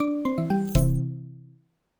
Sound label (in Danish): SMS modtaget; Alexander Vienberg; audio branding for laenken